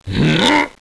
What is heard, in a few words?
sword ready